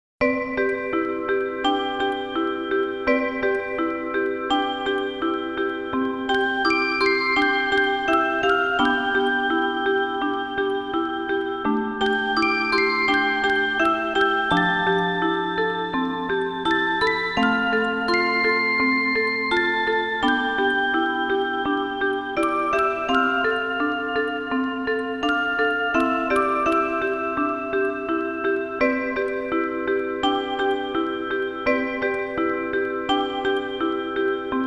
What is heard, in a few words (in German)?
Orgel; Akiho